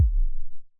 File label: kick